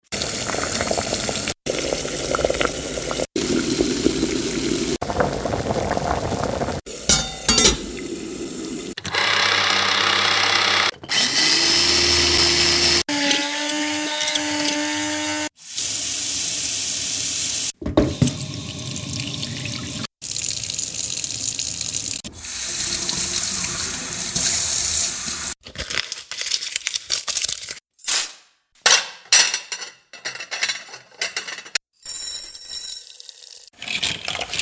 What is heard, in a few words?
Cooking; Sound of Italy